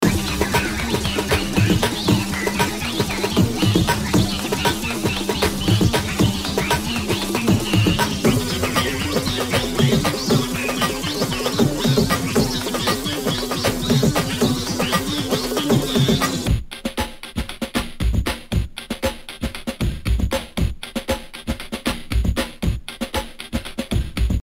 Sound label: putting random percussion loops together; putting random percussion loops together with no effort whatsoever; lolicore